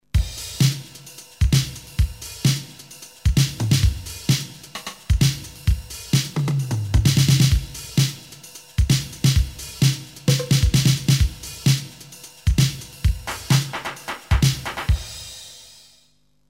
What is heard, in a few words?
DrumTraks